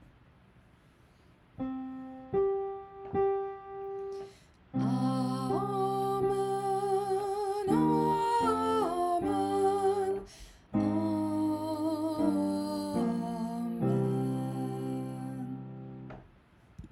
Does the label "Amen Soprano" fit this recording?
Yes